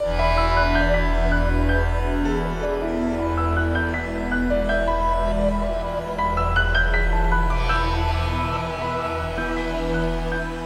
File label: Creepy TV theme